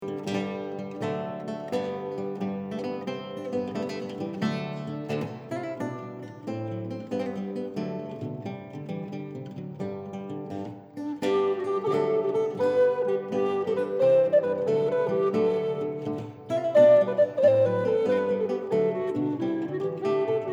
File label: bass lute